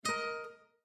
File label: Heritage Error; Windows 7